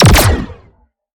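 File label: Sci weapon Shot Medium Laser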